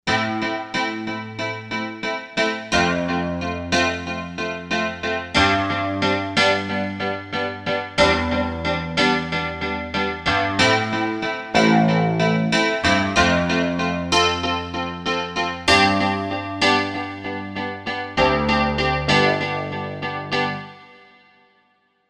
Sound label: Pick-Piano, Korg M1; Factory Patches